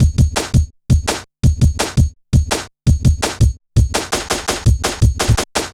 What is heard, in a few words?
Drum Loops, New Wave 167.5; Zero-G Jungle Warfare 1, 2 & 3; 90 s Sample CDs